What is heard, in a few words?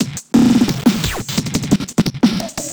Glitched Beat 175BPM; glitch kit